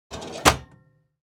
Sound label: Metal drawer closing